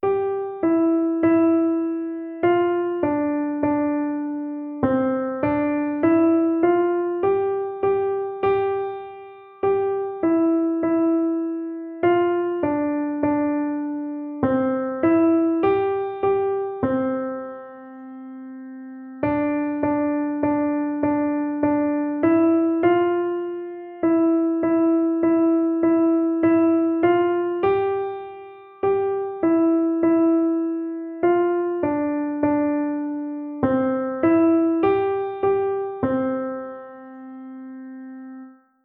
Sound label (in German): Klavierkurs Haenschen Klein Melodie; rechte Hand